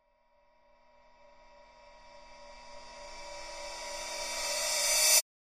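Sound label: Cymbal Reverse Approach Medium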